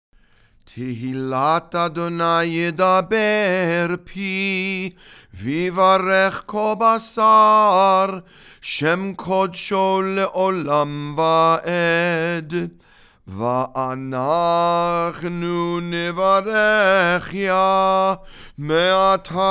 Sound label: ashrei read and sung